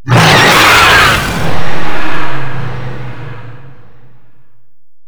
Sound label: Golem Attack Roar; Enemies; Warframe